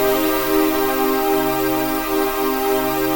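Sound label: chord pad, Pads; Best Service; XX Large - Pads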